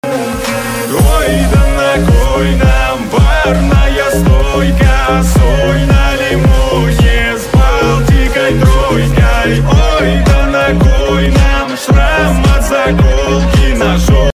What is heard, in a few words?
Tik Tok ringtone